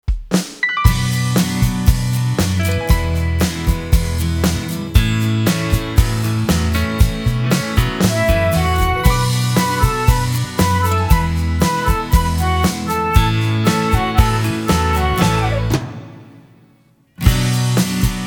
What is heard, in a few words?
karaoke version